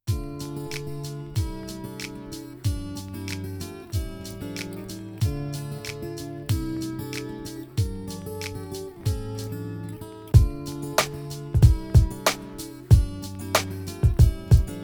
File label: Instrumental